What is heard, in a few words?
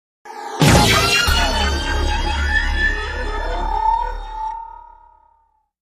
Smashed Drone